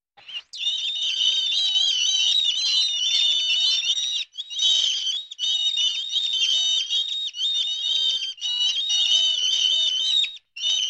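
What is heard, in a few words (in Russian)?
zvuk stai krys, pack of rats sound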